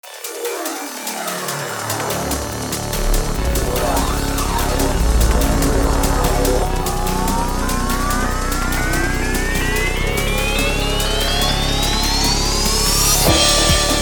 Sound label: Misty Dark Dubstep